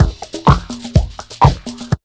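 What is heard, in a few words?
125 drum 2 R, Fresh Disco House; USB Soundscan vol.20; AKAI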